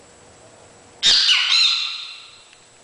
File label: Pitangus sulphuratus sound